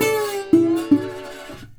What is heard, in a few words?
120, Blues Guitar; ILIO; Fretworks; SIMMS